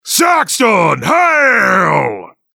Rage; "Saxtoon Haaaale!"; Versus Saxton Hale

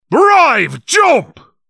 Jump; Brave Jump!; Versus Saxton Hale

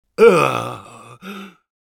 Engineer pain severe, es

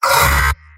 Medic pain sharp

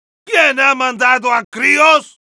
yell, es

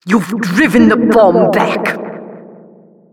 bomb reset

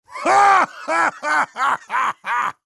laughter big, de; Heavy

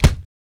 Taunt yeti chest hit

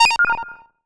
Hitsound electro

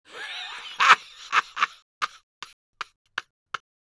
Soldier laugh long, es

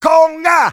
conga; Taunt demo

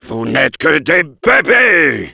yell, fr

